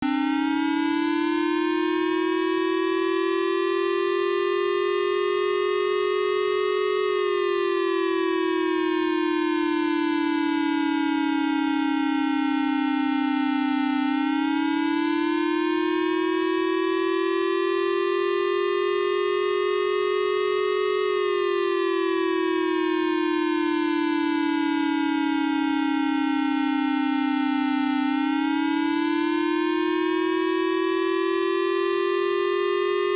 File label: siren